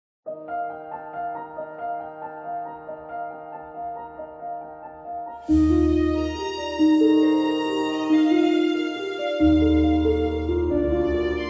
Bgm Ambience; Alchemy Garden; Windows

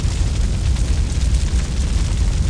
player hit Fire

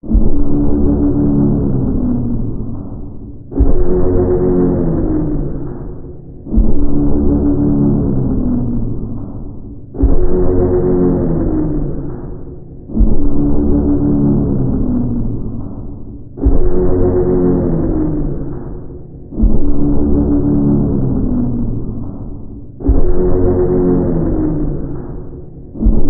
Music Scary; Pizza Tower early build test ost

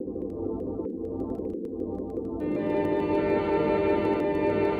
Ambient

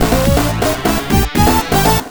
Goal; pro striker final stage; genesis

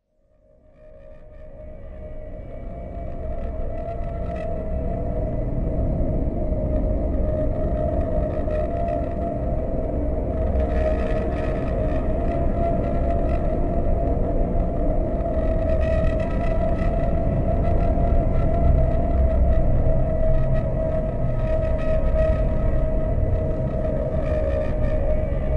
Pizzaplex Ambiance; Five Nights at Freddy's; FNAF Security Breach

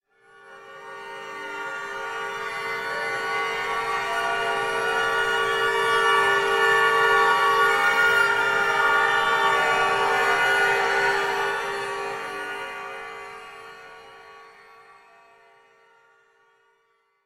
Jungle Suspense Strings; Far Cry

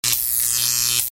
Killer Hero Electro; the friends escape the friends escape 2 windows 2013

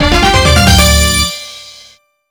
Goal

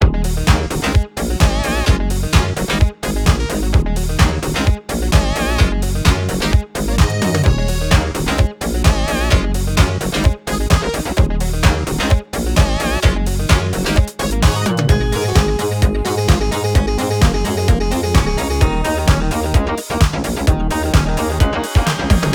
129 bpm

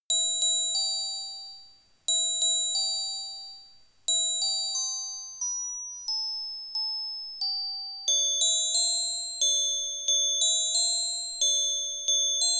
MUSICBOX; Lost Files of Sherlock Holmes; MS-DOS

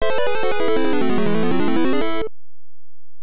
Captive is Shot; galaga nes

